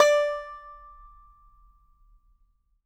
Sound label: Banjo D5 R; Sampleheads - Whole Lotta Country 1 & 2